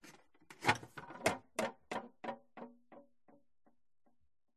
glitched tones household hallway fuse box open plastic